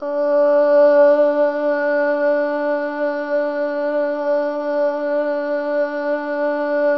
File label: SOLO BOY, OH; Spectrasonics; Symphony of Voices